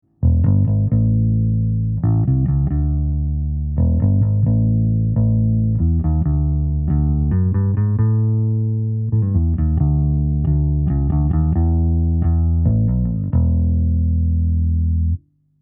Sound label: Rupert Neve Designs 517 Bass Amp EV PL20 Comp 9 blue light more often